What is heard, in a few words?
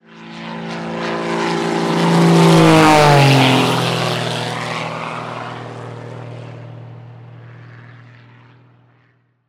stunt plane fly by; transportation